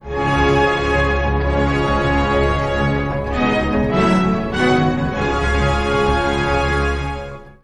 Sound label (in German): Melodie ohne Sprecher; Tagesschau